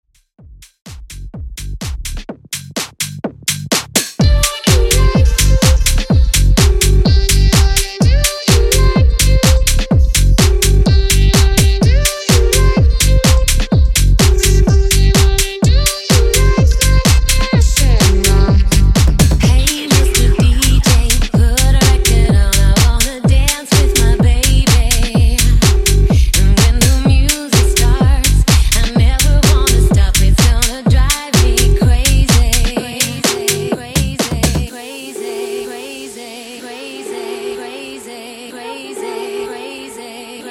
2000s tech house mashup

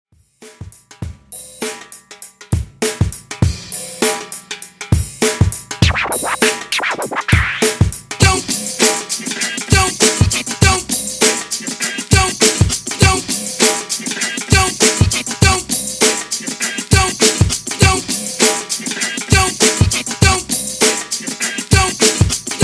Old School Redrum 100Bpm

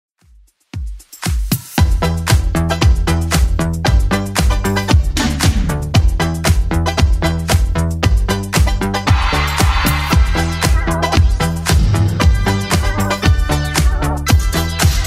80s Club Edit 115bpm

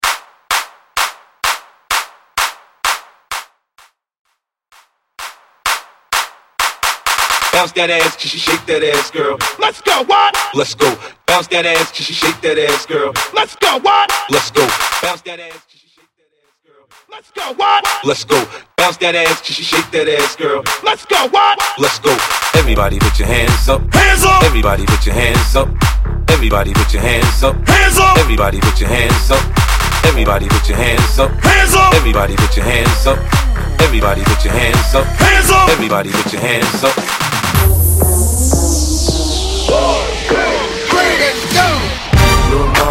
Tribal Blend; Mainstream VIP

